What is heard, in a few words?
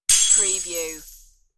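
Small Plate Break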